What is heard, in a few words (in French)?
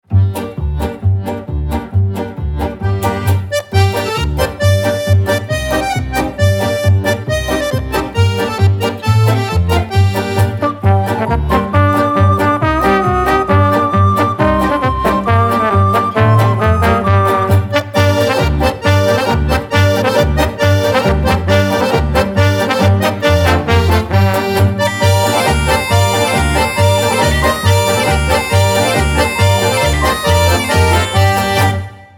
bourrée; Bourrée dite d'Aurore Sand